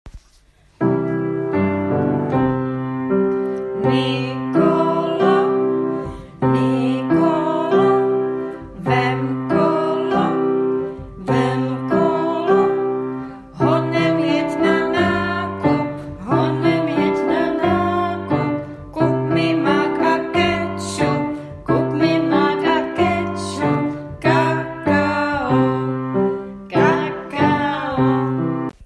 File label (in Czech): kolo zpev; Puntika a Pepiny; logochvilky; logopisnicky